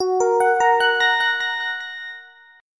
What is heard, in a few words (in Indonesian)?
Nada Dering Nokia Jadul; Revelation